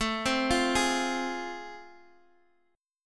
A Minor Major 7th Sharp 5th A, Guitar, Standard; AmM7#5 arpeggiated; x,x,7,5,6,4

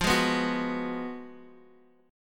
Gb Major 7th Suspended 2nd Suspended 4th

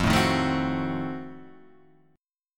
F 7th Flat 9th F; F7b9 Chord {1 0 1 2 1 2} strummed

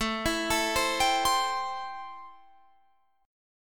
C 6th A, chord

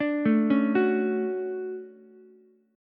D-Major 7th, D, Ukulele; arpeggiated; JGuitar

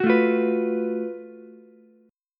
Gb Minor Major 11th Gb down Ukulele C6; GbmM11 strummed; JGuitar

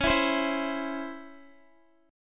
Db Minor Major 7th Db Guitar; strummed; x,16,14,17,16,x

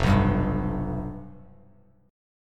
F Suspended 2nd Flat 5th, Bass Standard; strummed; 1,2,5,0; JGuitar

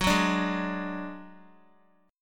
F sharp Suspended 2nd Sharp 5th, Guitar; strummed; JGuitar

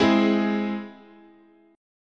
Ab Augmented, chord, guitar

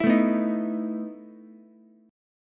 E Major 7th Suspended 4th, Ukulele; strummed; JGuitar

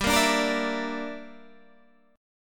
G Minor 6th, Guitar Standard; Gm6 strummed; JGuitar

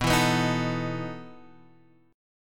E Major 7th Suspended 4th, B

